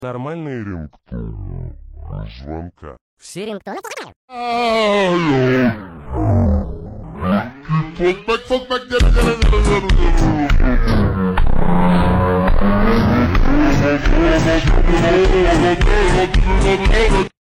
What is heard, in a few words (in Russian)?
normalnye ringtony dlia zvonka